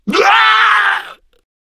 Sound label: Death, Male